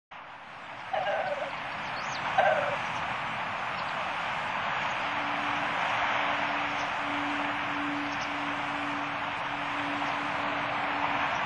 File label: Phalacrocorax urile; Sakhalin; Kuril; sea; priroda sakhalina i kuril